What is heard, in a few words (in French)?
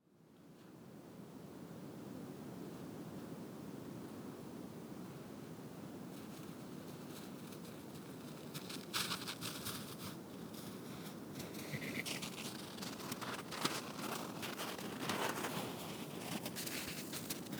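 mammifère passby parc national du mont tremblant; portrait sonore hivernal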